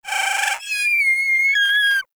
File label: Avichaea Lure Call; Hunting Critters